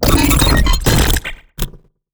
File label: glitch